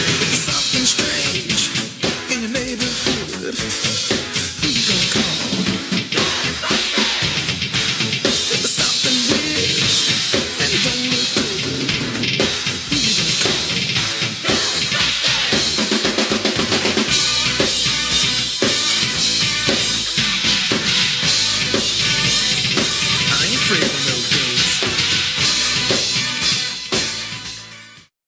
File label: Metal